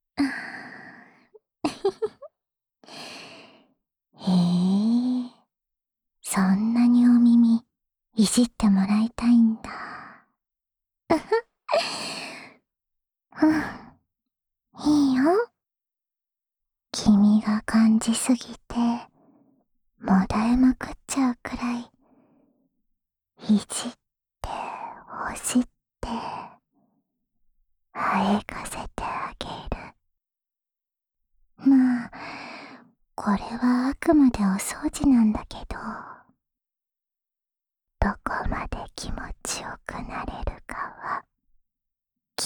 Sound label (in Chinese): 安眠用掏耳循环（左耳）, ASMR; 安眠用掏耳循环（左耳）